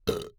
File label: burp; OPENAIR; Anechoic